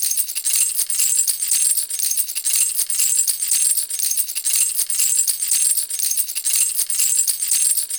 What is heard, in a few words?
Beat; pooh; merry games; jammin